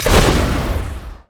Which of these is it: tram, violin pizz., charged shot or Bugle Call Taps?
charged shot